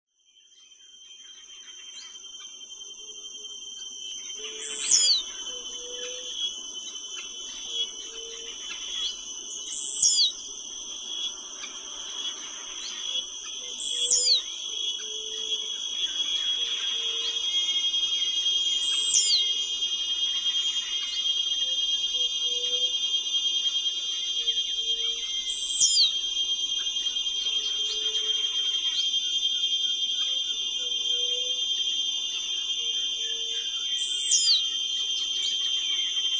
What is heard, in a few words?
Volatinia jacarina